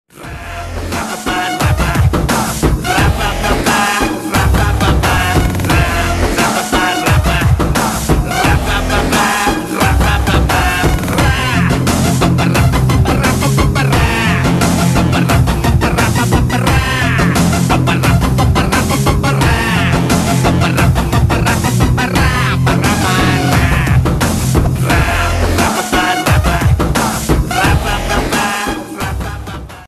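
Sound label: background music; thalapathy mass sceans in cooling glass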